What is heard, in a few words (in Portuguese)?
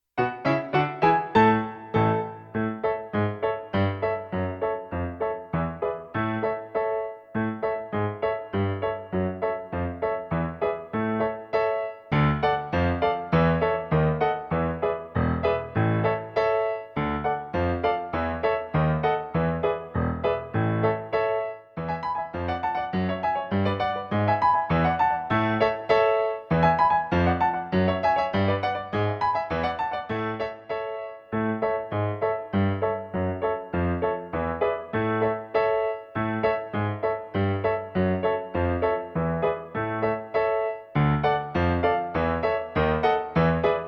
Candieiro PIANO; 15 canções capixabas